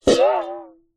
household bowl metal water inside pick up water wobble